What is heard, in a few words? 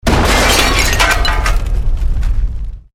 Explosion Medium Metal Glass